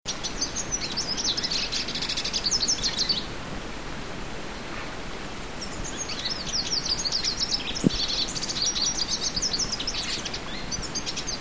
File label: Olivaceous Siskin; S. America